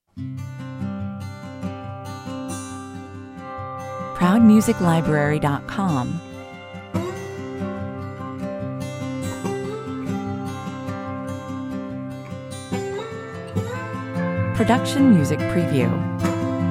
A beautiful and gentle Americana, folk track played on acoustic slide guitar over subtle steel guita, 135 bpm